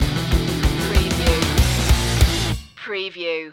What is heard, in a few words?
metal rage stinger